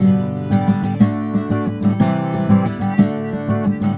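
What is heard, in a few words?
guitar, 120, Celtic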